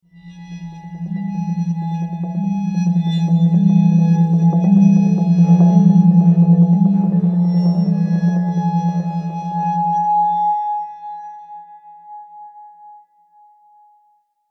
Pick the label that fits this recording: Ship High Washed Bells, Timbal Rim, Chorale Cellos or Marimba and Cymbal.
Marimba and Cymbal